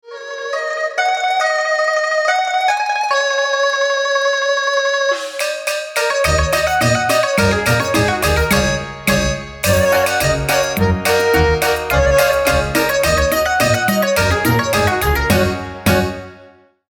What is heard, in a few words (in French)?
musique chinoise Asie